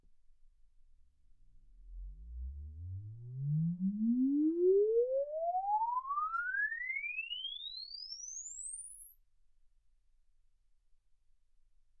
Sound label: exp swept sinus 10Hz 24kHz